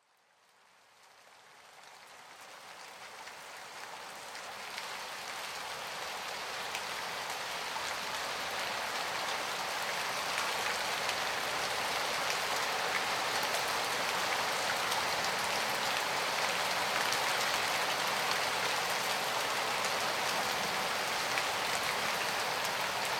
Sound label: rain